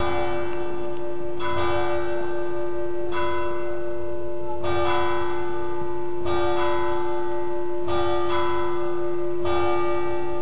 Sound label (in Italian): Campane